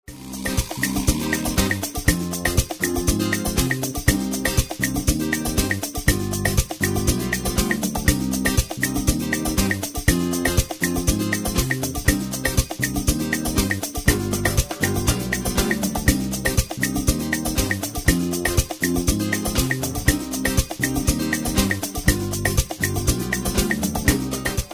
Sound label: smooth jazz jam